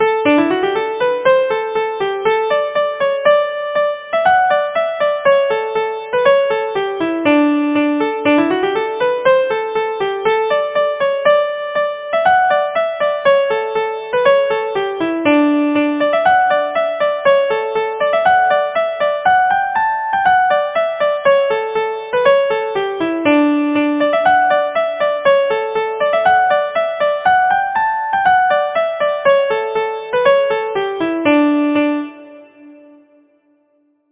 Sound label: polka